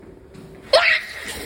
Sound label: bathroom scream